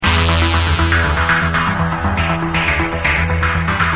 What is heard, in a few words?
ringtone